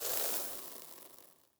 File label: room ash ready; Shelter